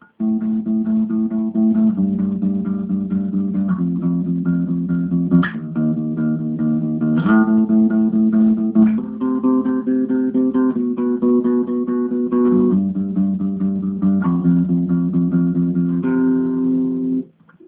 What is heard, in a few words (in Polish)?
Zwrotka